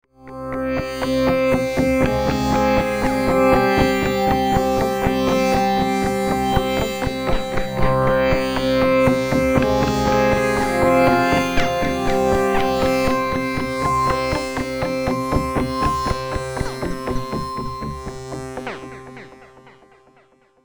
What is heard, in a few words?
Poly Seq